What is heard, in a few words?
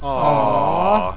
lose